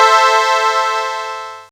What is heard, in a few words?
TECHNO; X-Static Goldmine 1